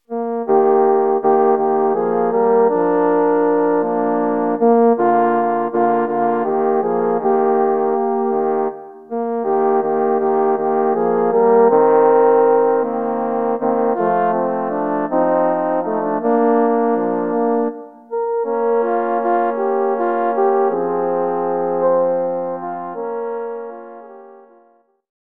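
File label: Auf Flügeln des Gesanges Horn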